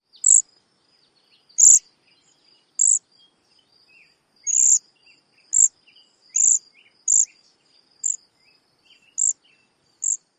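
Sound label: Cedar Waxwing trills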